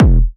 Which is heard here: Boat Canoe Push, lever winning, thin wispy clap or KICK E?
KICK E